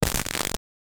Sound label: crack thunk slllr squish; glugging